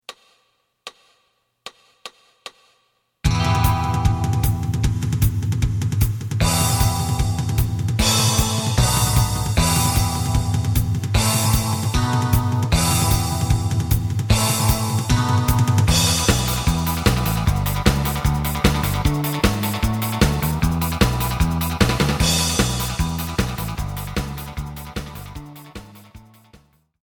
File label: lead